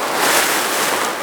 schneepflug; SkiAlpin2005

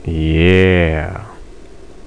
weiter Sound; Joker Verlag

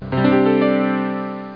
Schreibtisch; FirstClass; VillageSettings 3.80

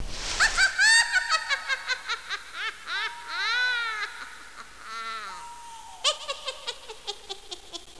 Sound Baum L

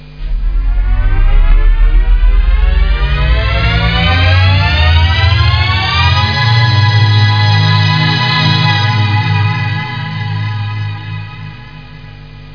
aufzug

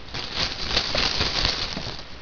rascheln, ambience

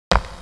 Stein; Anno1602

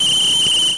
pfiff

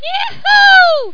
Juhu

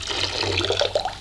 wasser; Little Red Riding Hood

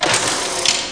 Kamin Auf

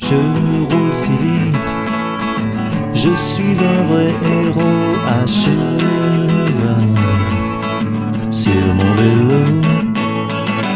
Musik

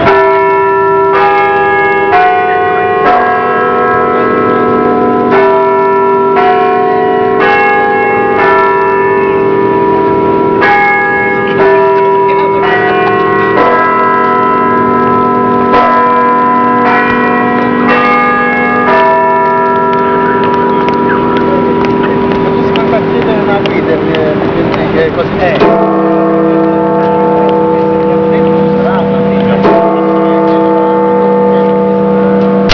kirchenglocke; german; glocken; Pinnacle

